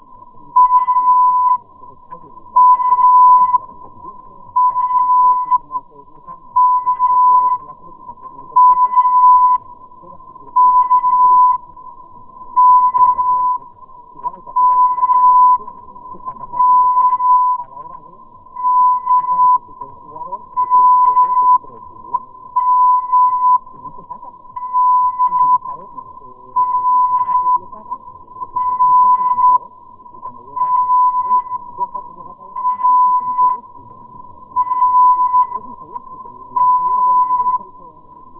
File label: 1485kHz